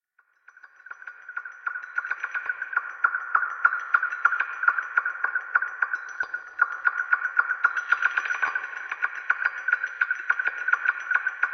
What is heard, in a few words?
Pipistrelles; colombia; Pipistrelles 1; Metal bats